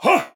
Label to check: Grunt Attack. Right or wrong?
Right